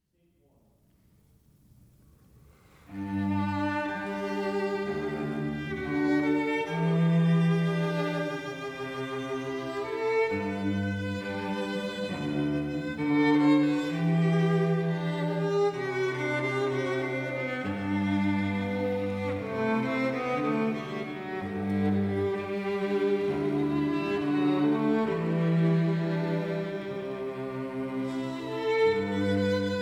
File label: Violin, Viola & Cello